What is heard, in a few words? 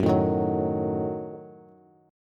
Gb-Minor 6th, Bass; strummed; JGuitar